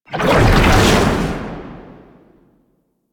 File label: Perfect Heavy Attack Activate; Warframe; Weapons; Melee